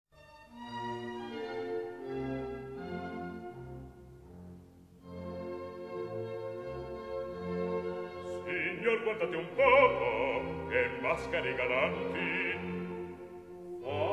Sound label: menuet